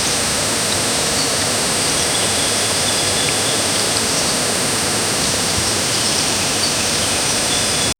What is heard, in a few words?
distant growls rustling l, jungle